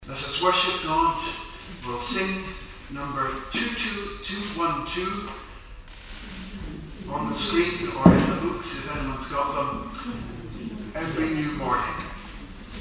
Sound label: hymn